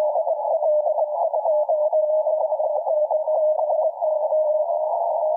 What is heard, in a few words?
ALC chirp